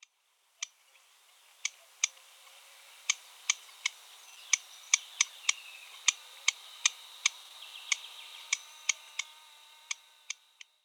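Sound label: solovinyy sverchok voice, river warbler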